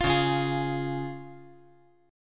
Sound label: C sharp Major